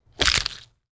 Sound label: bone breaking impact